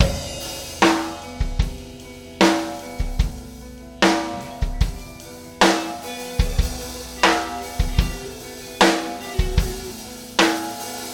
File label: loop